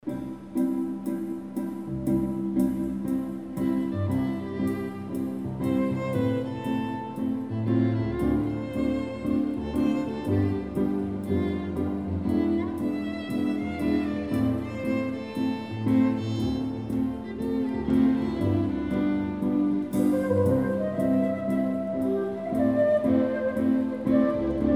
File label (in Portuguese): solo flauta e violino, Piano e Cordas